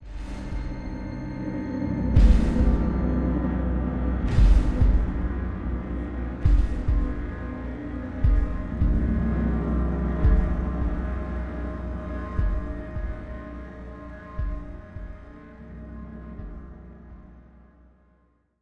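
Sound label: sting, creepy; transformers war for cybertron gamerip; IACON IN RUINS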